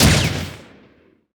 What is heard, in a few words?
uzi fire; weapons